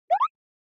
Anime Motion, Short Low

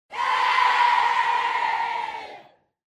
Cheer "Yay" Mid Solo

